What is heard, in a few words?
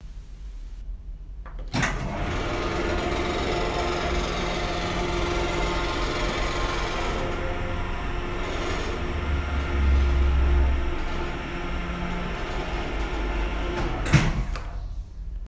Lift